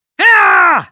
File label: damage